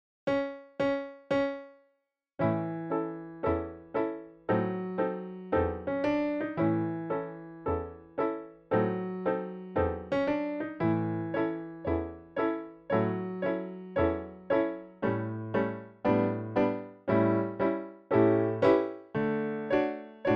Backing Track

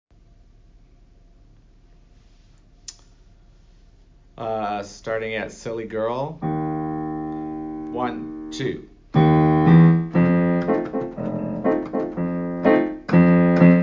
melody

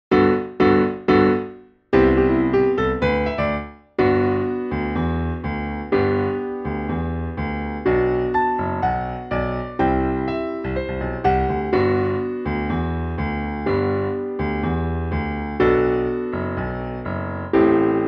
accomp

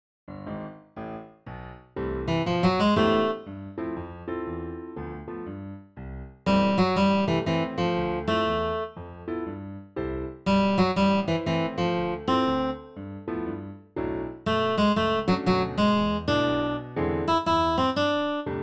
BASS SLOW accomp melody

